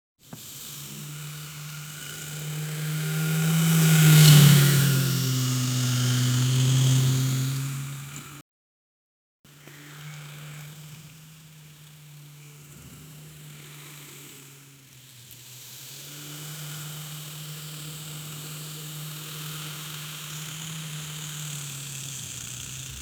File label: plastic toy car moving